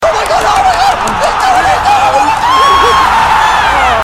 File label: pixel scream